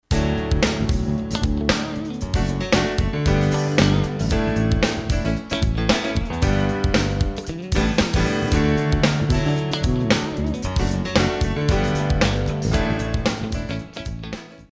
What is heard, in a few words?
musicbed sample, contemporary